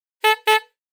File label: Cartoon Comical beep